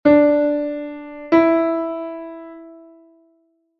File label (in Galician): 2ª Maior RE-MI, INTERVALOS